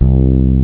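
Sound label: fretless bass; jazz jackrabbit 1; M8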